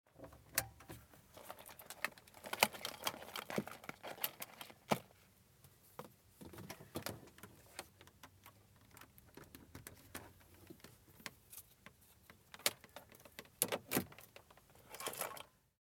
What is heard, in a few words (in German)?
Navi anbauen